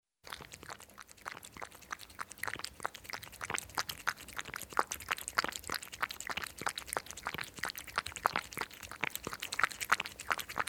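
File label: cat drinking milk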